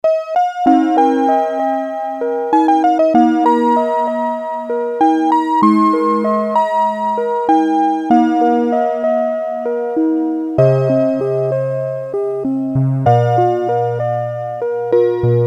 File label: rhythm song, clocks